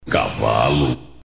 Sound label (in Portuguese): cavalo